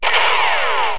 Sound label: striker fire